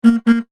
Industry Hi Lo Horn Beeps Twice; Factories; Backgrounds

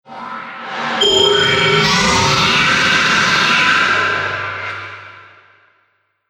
Activate Future Engine; Science Fiction Sound Effects

